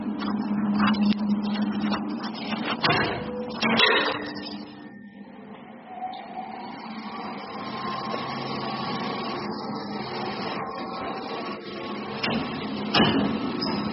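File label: sounds of an elevator from the machine room; zvuki lifta elm iz mashinnogo pomeshcheniia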